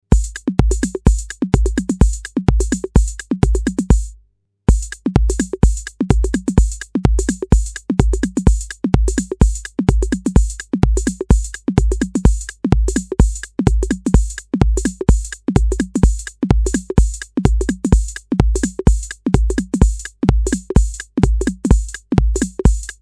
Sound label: drumatix 909 shuffles